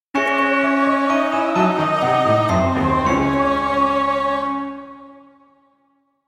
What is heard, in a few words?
Game Over